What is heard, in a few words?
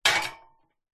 industrial sack truck trolley metal impact concrete